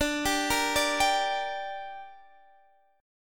D Suspended 4th Sharp 5th D down Guitar Standard, chord; arpeggiated